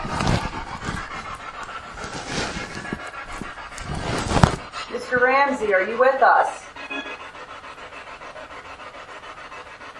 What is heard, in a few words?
Ballroom Spirit Box; the castle at mount savage investigation